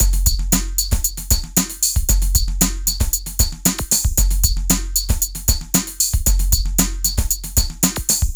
DESERT BEA 115, LOOPS

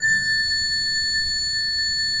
A5, Cathedral Organ; ILIO; Propeller Islands Cathedral Organ

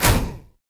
stomp vox; Drums In Your FACE!

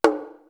DJEMBE 2; East West; Global Sounds

